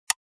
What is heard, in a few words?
Plastic flashlight switching off